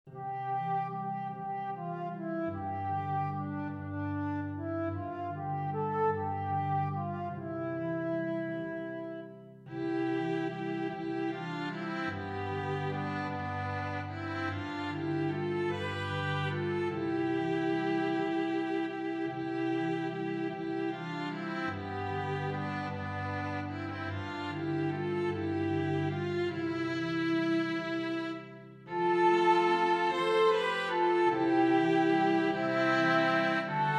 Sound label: INSTRUMENTAL; Jesus Once Was a Little Child